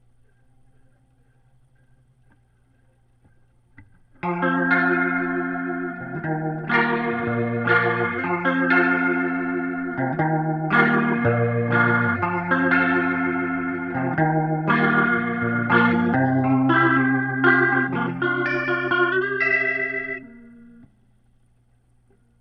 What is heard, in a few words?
Leslie gtr